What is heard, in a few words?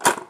open lid empty; Mass storage; TS2020; zxsp